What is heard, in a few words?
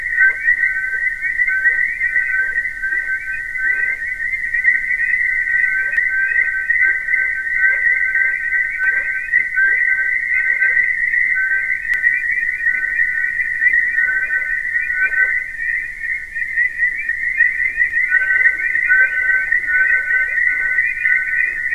WFR audio emfisis